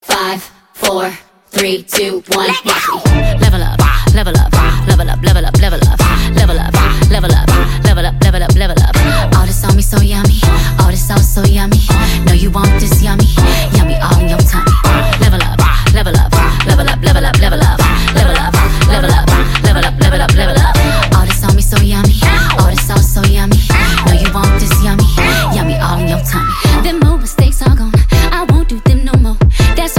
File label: Level Up